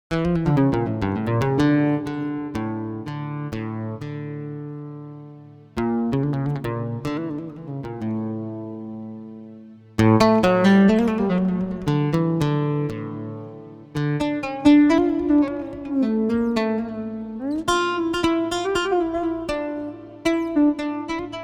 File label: taksiem hijaz